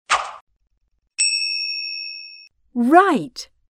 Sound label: correct shoot